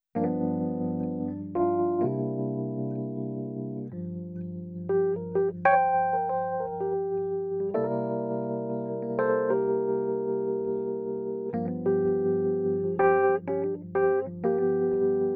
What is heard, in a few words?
Rhodes Fet II; Daking; 33609